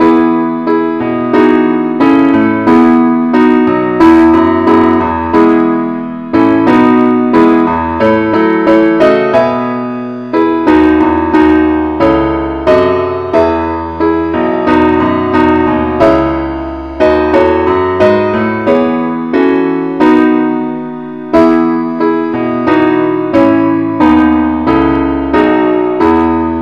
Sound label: keyboard only, key E; christe Sanctorum